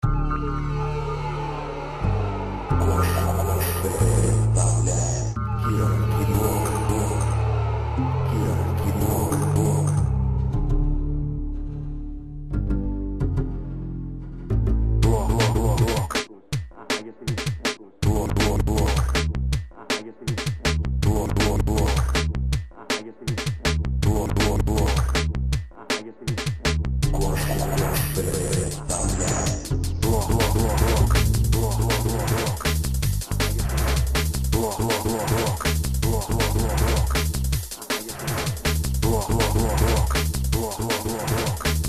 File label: drumnbass mix